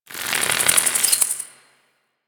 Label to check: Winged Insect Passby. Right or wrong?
Right